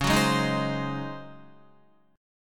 C sharp Major 7th Suspended 2nd Suspended 4th Guitar; C#M7sus2sus4 strummed; JGuitar